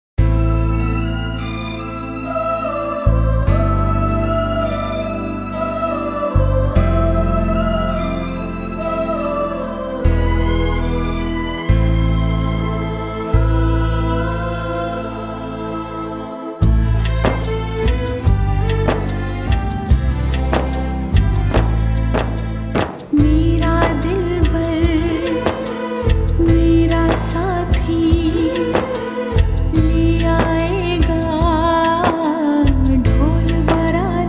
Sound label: Tera Dilbar Sad; Yeh Dil